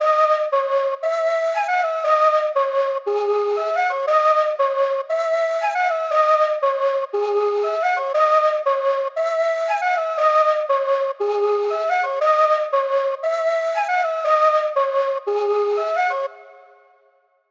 118 BPM C# Min Quena, melody loop stem; Trap; Loop Stems; Cymatics; 2022 Melody Collection - Trap Pt 2; Last Fight

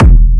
Techno Kick; 30 Techno Kicks 2025; Ghosthack

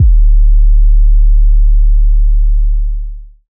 808 - D; Drums - One Shots; 808s; Hip Hop

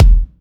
House Kick D#; Drums; One Shots; Kicks; Cymatics; EDM Starter Pack

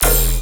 Bass Hit E, Dubstep

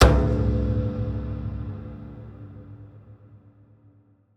Percussion Hit; One-Shots; Ghosthack; SkyRealm; Percussion Hits

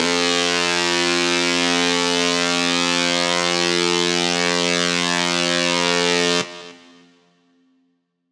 Synth Shot F; Trap and Dubstep; Synth One Shots; Wasp; Ghosthack; Free Trap and Dubstep Samples 2018

